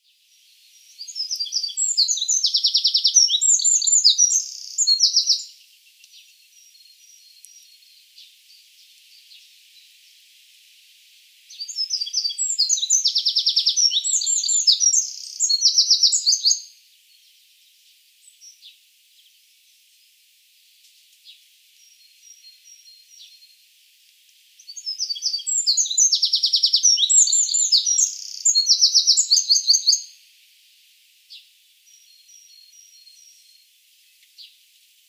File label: Troglodytes troglodytes; SETE